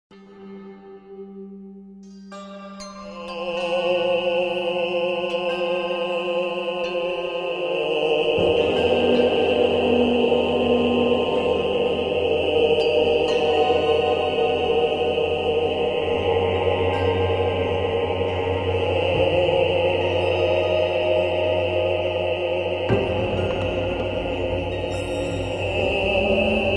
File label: Male Choir